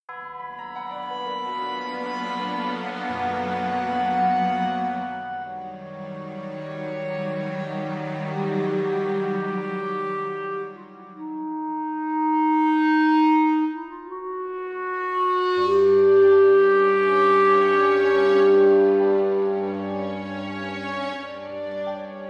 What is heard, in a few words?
ambiance sinister